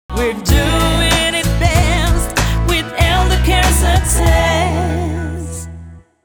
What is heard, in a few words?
jingle; ELDERCARE